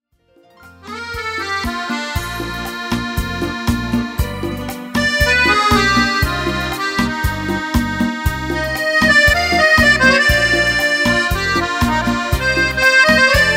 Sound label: beguine